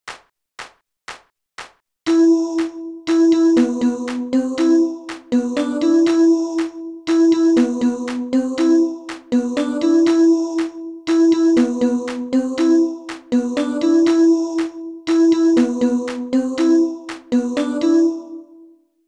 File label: boucle